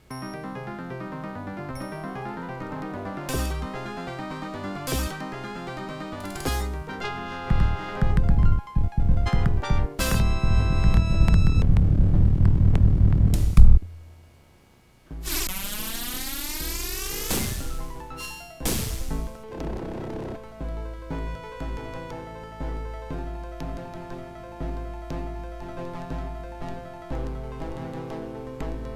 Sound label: Intro, AWE64 Value; wc2; Sound Blaster AWE64